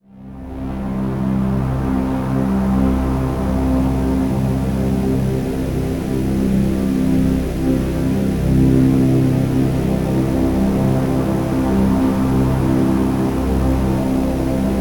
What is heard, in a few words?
Drone Mammoth C; Ghosthack